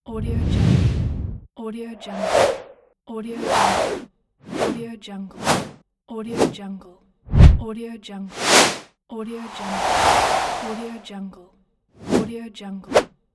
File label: whooshes